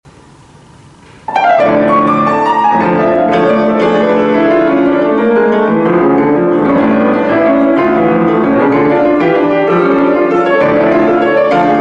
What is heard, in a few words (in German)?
d-moll Allegro con fuoco; J.B.Cramer